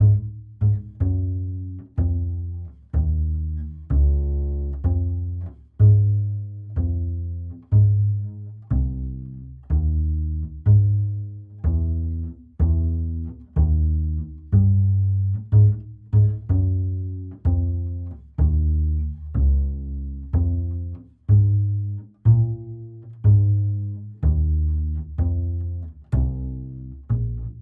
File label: EQ Bass; TubeTech